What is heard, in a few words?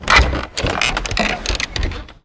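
door big wood open; Mafie 1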